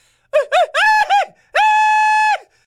charge, Voices Emotes

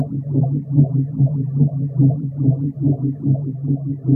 spinning, Machines

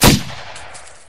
PPSh, Guns Fire; Weapons

